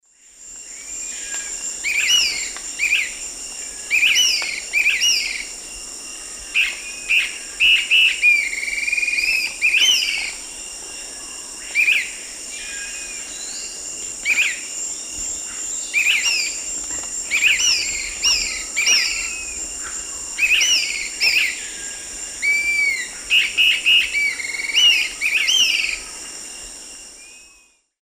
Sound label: Myiarchus tuberculifer, alarm calls; S. America; Eastern Lowlands